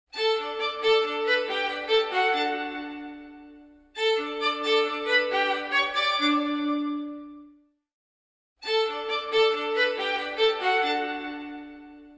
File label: Elegant Simple String, ringtones; LG Velvet